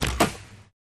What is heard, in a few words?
Archery Shoot Arrow